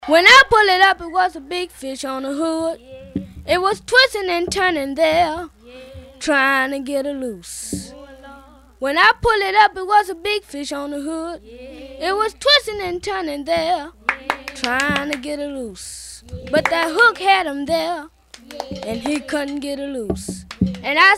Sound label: CHILDRENS CONCERT